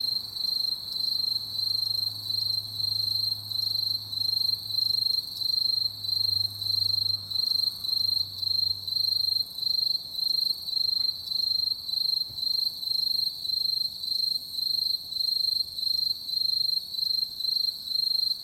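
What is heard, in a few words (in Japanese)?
insect, Aomatsumushi; aomatumusi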